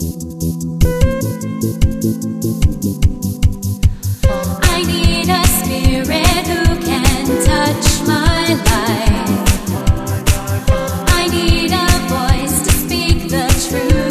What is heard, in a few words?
Techno Fusion